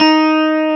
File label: GUI STRATO, E-Guitar; E-GUITARS